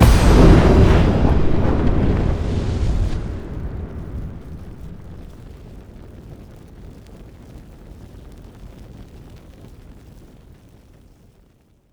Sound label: free Spin Outro Showing; netent